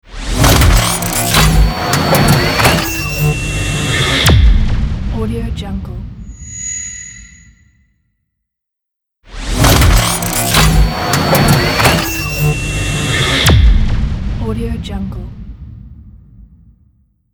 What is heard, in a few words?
scifi cyber logo